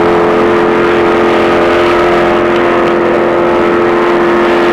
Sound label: ox engine